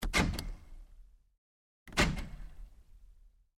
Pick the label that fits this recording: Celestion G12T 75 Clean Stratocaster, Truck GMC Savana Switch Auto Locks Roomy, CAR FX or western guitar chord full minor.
Truck GMC Savana Switch Auto Locks Roomy